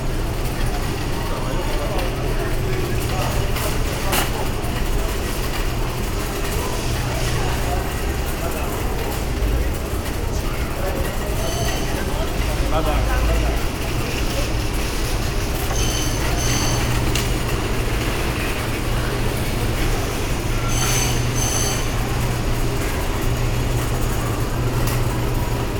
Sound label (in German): supermarkt, Brasilien; Fieldrecordings